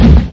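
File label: dull knock of a door opened with force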